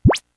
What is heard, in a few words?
Sounds Effects